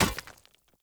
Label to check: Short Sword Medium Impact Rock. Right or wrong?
Right